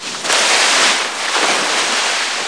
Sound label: wave break; charley cat